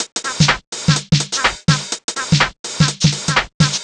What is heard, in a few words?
drum triple top 125, Drum Loops, Classic Chicago House